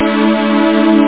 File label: chord